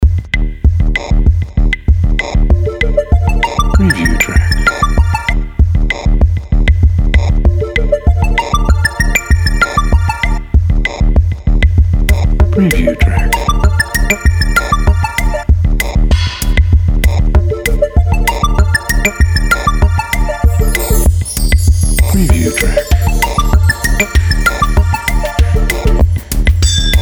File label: Cute Synth Trickle